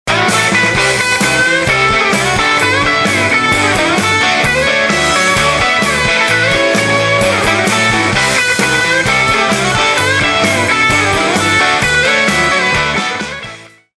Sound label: ring rock, phone; HP iPAQ Glisten